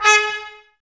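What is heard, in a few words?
Brass trumpets G#, Mute; Miroslav Vitous - Woodwind Brass Ensemble; Tps Mute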